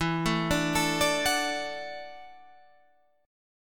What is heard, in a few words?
E 7th Suspended 2nd Suspended 4th, down, Guitar, Half Step Down